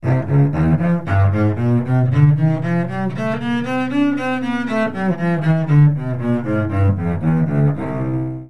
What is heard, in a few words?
up down scale fast, cello